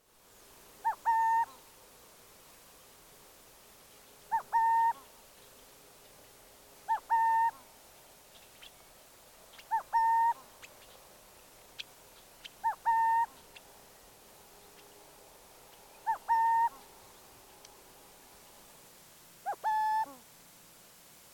Burrowing Owl Athene cunicularia

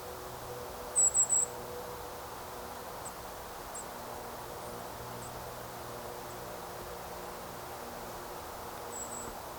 Golden crowned Kinglet Regulus satrapa

